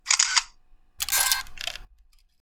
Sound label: Camera Shutter Sound